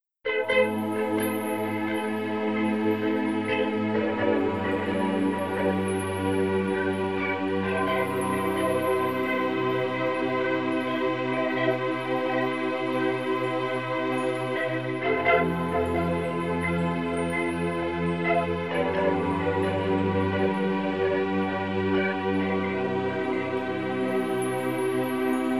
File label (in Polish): taneczny relaks 432 hz